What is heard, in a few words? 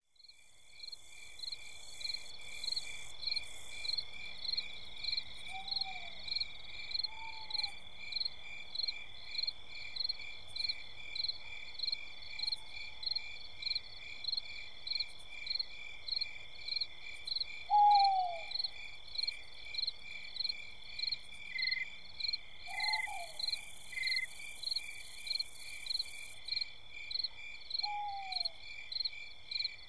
Owl Forest